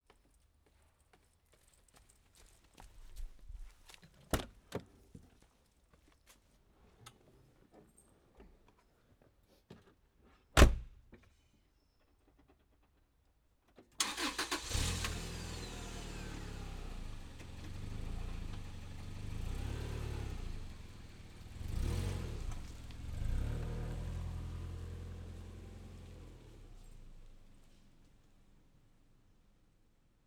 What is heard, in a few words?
car drives away; sound effects and sound reinforcement